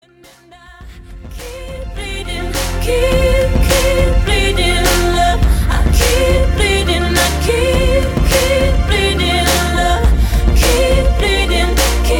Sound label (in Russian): Пение с придыханием